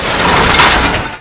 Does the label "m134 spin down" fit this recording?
Yes